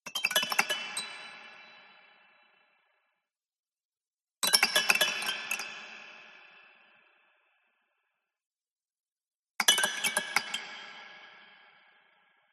HORROR Haunted Strings Tinkle Sequence Fast Short Hard; MUSICAL; smartsound